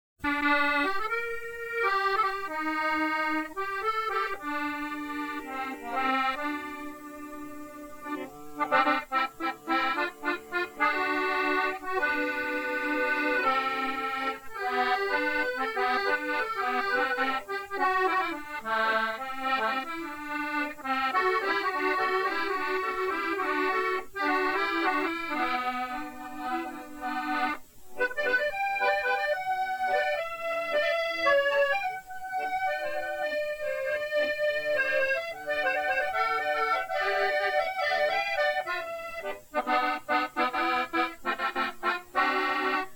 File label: Italian Accordionist; Atlanta